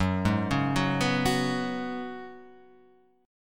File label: F sharp Suspended 2nd Suspended 4th, Guitar Half Step Down